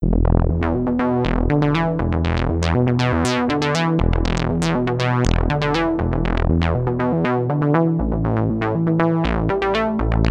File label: biquad filter; fathom